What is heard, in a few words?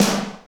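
snare, Techno Rock; Northstar; Drumscapes Roland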